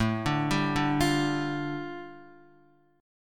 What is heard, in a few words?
A 7th Suspended 4th Sharp 5th A Guitar, chord; arpeggiated